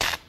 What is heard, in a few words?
Spark B